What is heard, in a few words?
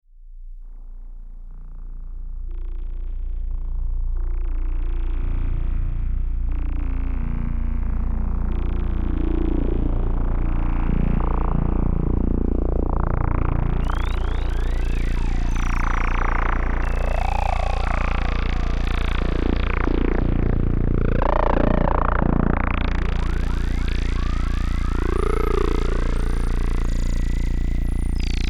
Drone; Xaoc Devices Sofia